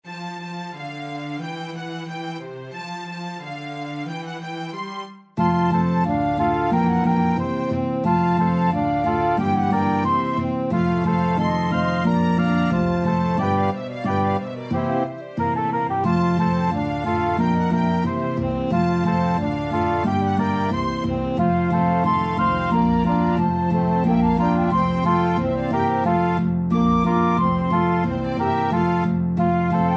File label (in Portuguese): Bicharia, jumento, cachorro, gata